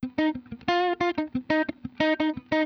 090 Chill Pop S-Note Guitar Fmin, Chill Electric Guitar; Pop Rock; Pro Tools Essential Loops; Digidesign